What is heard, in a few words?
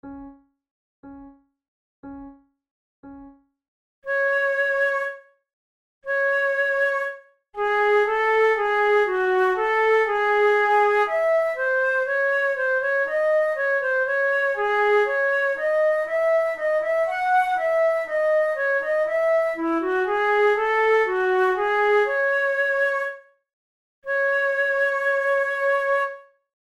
prelude, flute